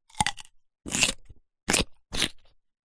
human eat bite munch cucumber; zapsplat

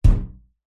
impact metal thump front washing machine

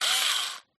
screwdriver electric rev short; Skyclad Sound

